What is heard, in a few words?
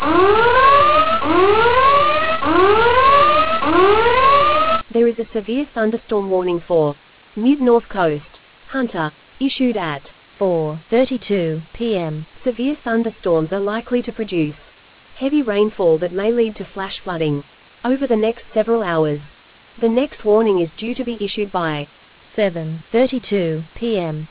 storm audio